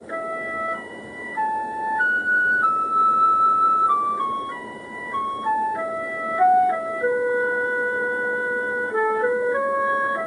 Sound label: synth flute; It Came From Outer Space End Cast